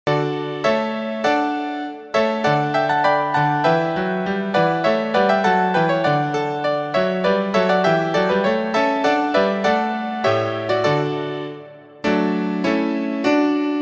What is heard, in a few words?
accompaniment